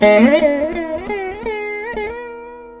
VEENA; Spectrasonics; Heart of Asia

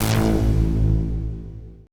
AMBI FX

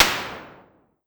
Noise Hit Hi, Drum Machines DDrum-AT Pitched Down

